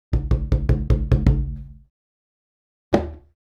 Conga Fill; Essential Percussion

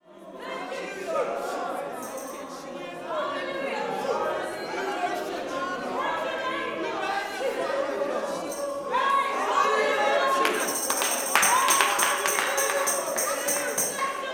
WORSHIP, WORSHIPAMB, Vocal Planet, Gospel

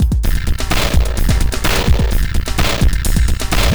Processed Beat, Drums & Percussion; Electrocution; Processed Beats

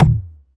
Percussions

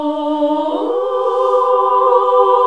SING, CLASSIC VOX; Best Service; Hallelujah

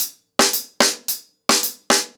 110BPM, ACOUSTIC DRUMS

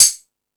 More Cymbals, E-MU Pro-Cussion

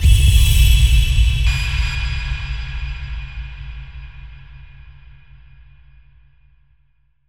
Dubsta Fx; Zero-G Sound Sense Grime Scene; 90 s Sample CDs

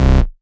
Basses Root C

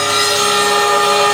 GRIND, Power Groind; E-MU Producer Series Vol. 5 – 3-D Audio Collection; 3DSprints

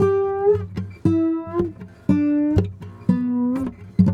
Sarangi Down, Acoustic String; Sonic Foundry (Sony Creative Software); Friendly Fire

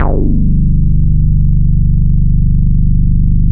GOOM BASS, Bass Analog & Synth; AKAI; USB Soundscan vol.12

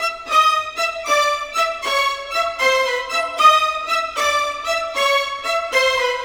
Cinematic Viola Violins; Sonic Foundry (Sony Creative Software); Orchestral Series VOL-3 - Cinematic

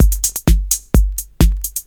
Straight Loop 128 BPM, House

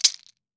maracas; Cuba

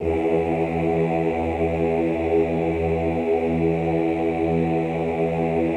OOH E1, Classical Choir; Best Service; Extended Classical Choir

